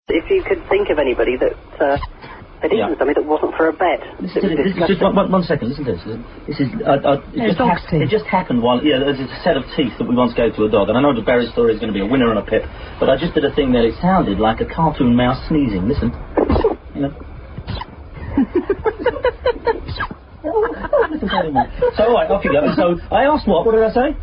sneeze

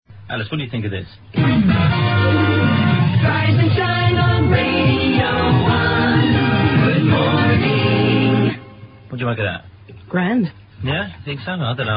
jingle

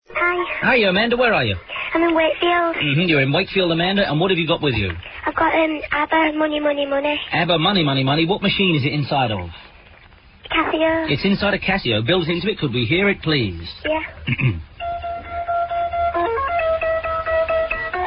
casio

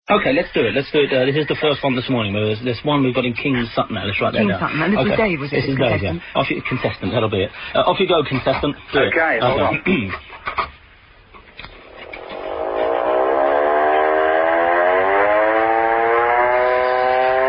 klaxon

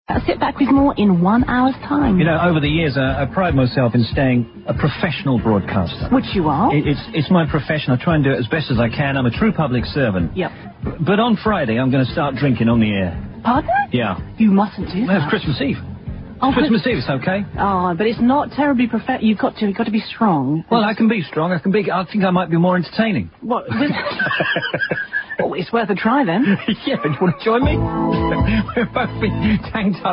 drink

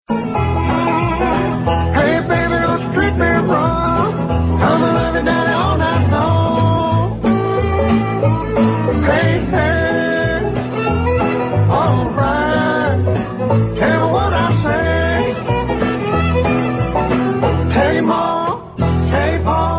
country

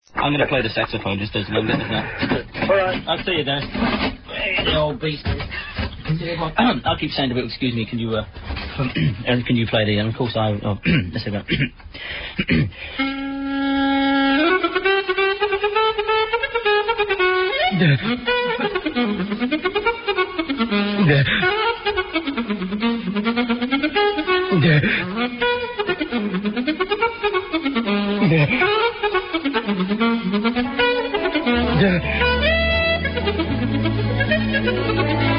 sax

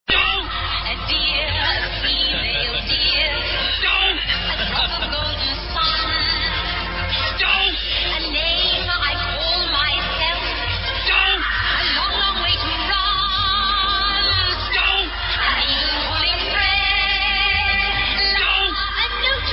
doh song

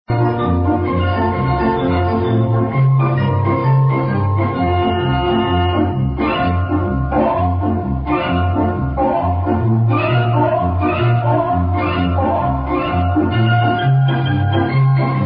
organ

